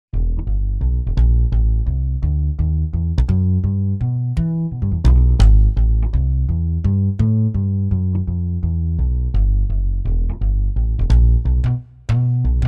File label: bass